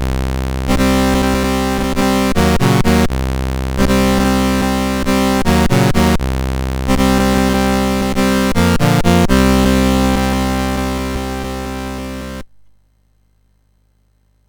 Project Firestart, MOS6581R4

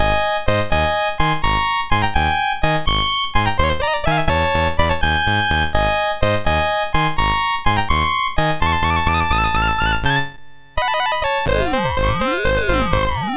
MOS6581R2, 8BitChiptune

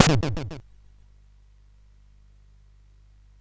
SFX; Narco Police

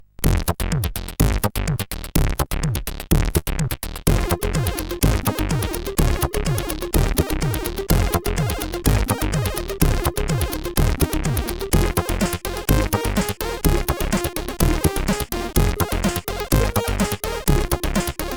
Groove Machine, CSG8580R5

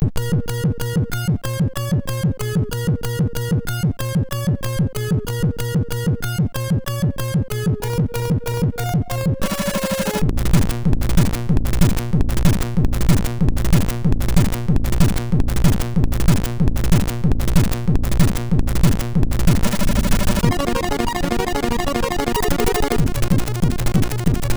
Rotation sid MOS6581; hvsc

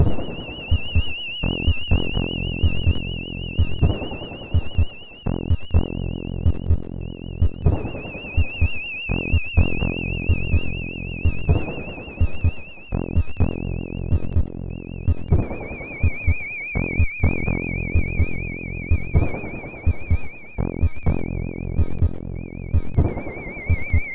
Oriental Music

